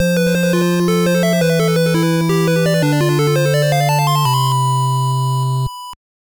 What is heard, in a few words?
Game Over; The Bugs Bunny Crazy Castle; FDS, NES